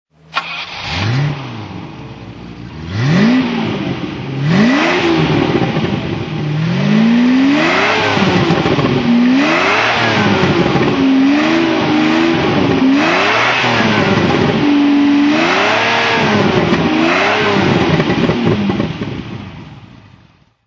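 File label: jaguar e-type v12 fhc throttle, engine sound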